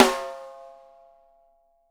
WOOD, Living Drums

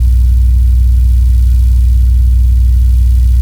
Drone Static; Drums & Drones

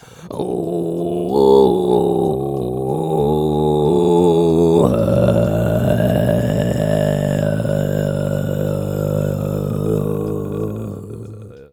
MONG SING, MONGOL VA; USB Soundscan vol.60; Asian Traditions; AKAI

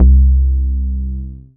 Single Electric Basses, Dance Floor Bass

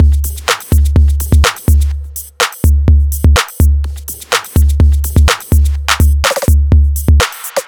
Drum Loops, 150; AttaBoy; M8; Electronisounds

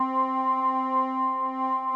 c3, Organ, AKAI S-1000; Best Service ProSamples vol.55 - Retro Sampler

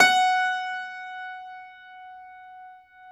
Classical Piano ff, F3; Total Piano; Brilliand Classical Piano; Classical Piano ff -ped

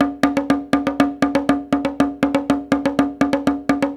Haitian Congo, World Dance; Spectrasonics - Supreme Beats

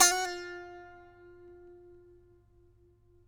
SAZ; Spectrasonics; Hans Zimmer Guitars VOL-2

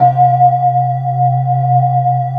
HOLY BELL C3; Best Service Dream Experience

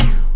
mini drums; Pro-Rec; Synthsonic

